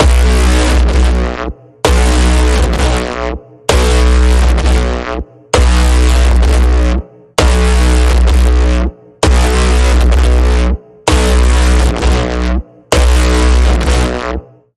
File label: Pulsing Code Generator, B